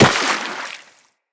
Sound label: Operator Run Water, Footsteps; Characters